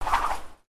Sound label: whip gesture